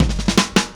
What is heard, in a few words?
Happy Pub Beat Intro, Indie Rock; Sonic Foundry (Sony Creative Software); Indie Rock Anthems; Session 05 - Happy Pub